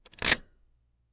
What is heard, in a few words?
realistic metal lever pul; mechanism